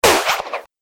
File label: Droid Grappling Hook Shot, robot; Robot Sound Effects